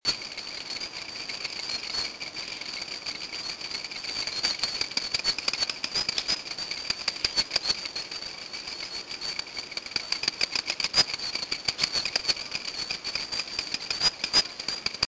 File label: Eleutherodactylus abbotti National Botanical Garden Santo Domingo Dominican Republic; A chorus has a certain telegraphic quality; West Indian